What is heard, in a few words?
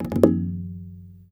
TABLA LO FIL 2, Indian perc; Spectrasonics - Heart of Asia CD2